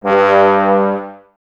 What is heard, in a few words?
BRS TBS G, Woodwind Brass Ensemble, Brass; Miroslav Vitous - Woodwind Brass Ensemble